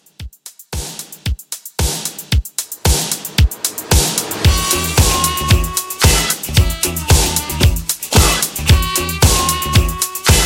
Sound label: 70s Funk Re Drum 113